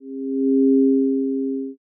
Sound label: zero g